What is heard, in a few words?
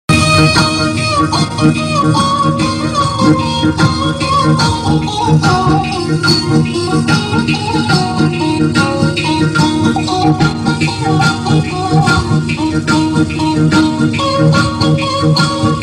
Balochi Ring Tone